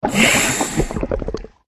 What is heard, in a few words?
murena death